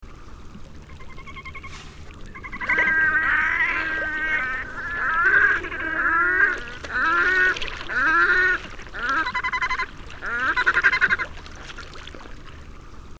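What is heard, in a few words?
red-necked grebe voice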